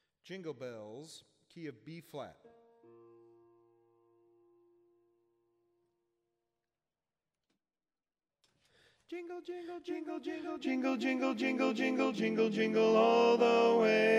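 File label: Jingle Bells baritone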